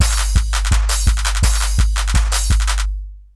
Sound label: Lo-Fi, Essential beats; FM 57 - The Ultimate Sample Collection (1997)